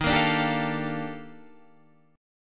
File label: Eb Major Sharp 11th Eb Guitar DADGAD